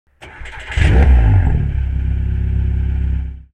TCR Start Sound, Audi RS 3 LMS; motorsport

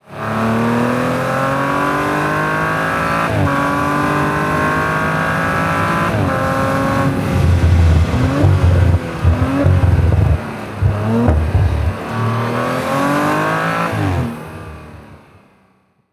Audi RS5 2.9 TFSI Rückschaltung

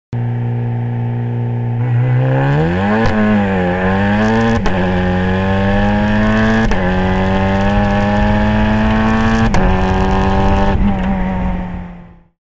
TCR Pit Exit Sound; motorsport

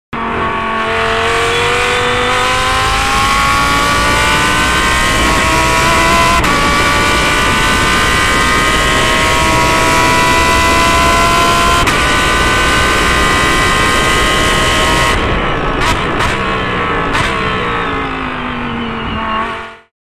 GT3 Full Speed Sound, Audi R8 LMS GT3; motorsport

